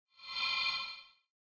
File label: idle spell; smite; god ganesha skin11